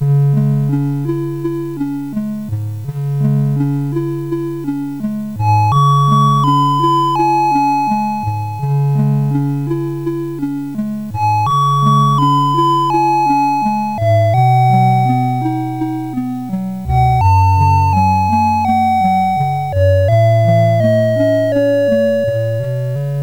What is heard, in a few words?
CSG8580R5, GAMES; Snowman Mr Freeze